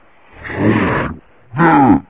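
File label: zombie idle; svencoop; custom monsters; necroscasm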